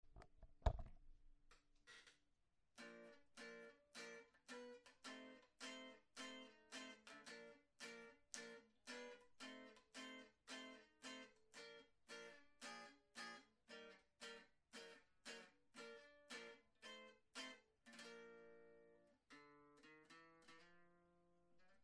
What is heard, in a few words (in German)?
akustisch